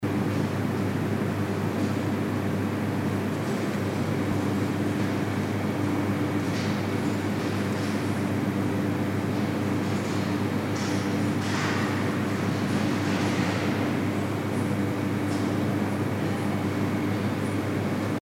large canteen atmo

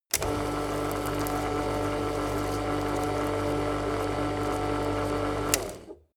shredder manual

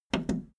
video tape player plastic fast forward click slow

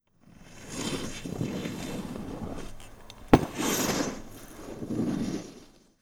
snowboarding with jump

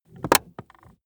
plastic plate crevice click

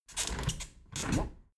window open heavy sequence

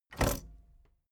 tape deck bounce

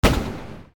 shot

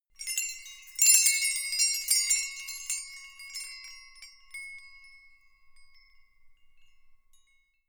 windbells ringing

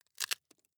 wood chip small break creak

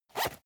zipper use short fast

